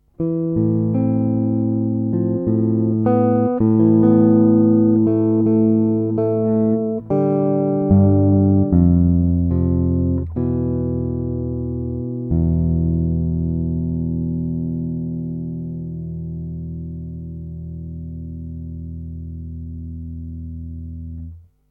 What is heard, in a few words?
eg dry